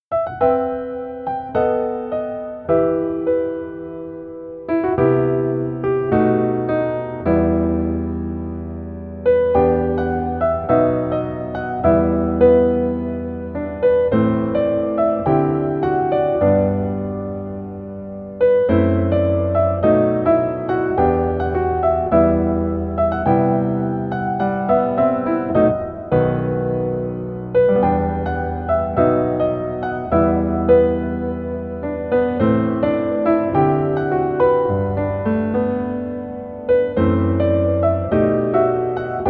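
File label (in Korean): Piano version 멜로디 MR -1키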